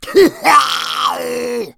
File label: smoker spot prey; voice, idle